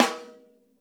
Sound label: Drums Acoustic, RAW KIT; AKAI; 90s sample CD; USB Soundscan vol.10; RAW KIT 5